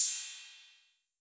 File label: Cymbal A 808 Decay A, Clean, Digital